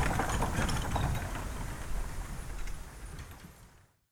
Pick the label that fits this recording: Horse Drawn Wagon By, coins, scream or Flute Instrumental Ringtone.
Horse Drawn Wagon By